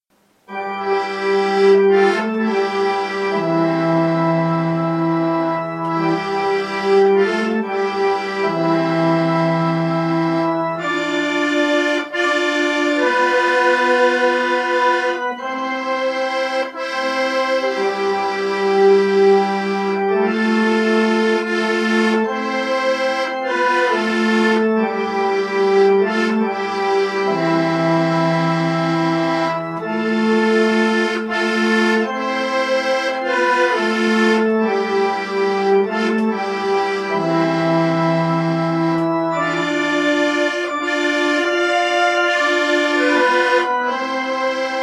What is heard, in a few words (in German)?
Stille Nacht Orgel - Keytar